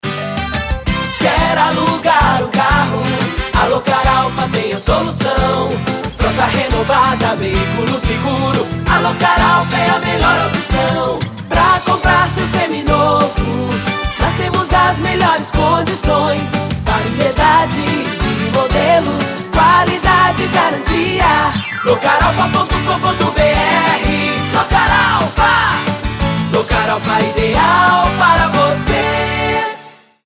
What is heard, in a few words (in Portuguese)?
jingles; locar alpha